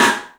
STEEL, Drums, ROOM SINGLE; 3D Sound

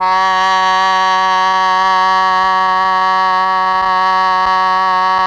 OBOE; ILIO; Propeller Island Legendary M400

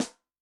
Snare, Snare; Yamaha DTXpress-II

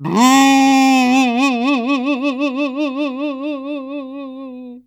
CHINA, Heart of Asia; Spectrasonics; CHINAFLT

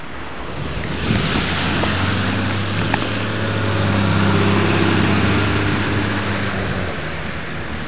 loop, techno atmos

